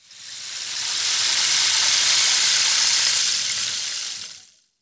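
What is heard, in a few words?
rain sticks; Spectrasonics; Heart of Africa 1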